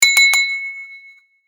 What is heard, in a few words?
notification triple ding loud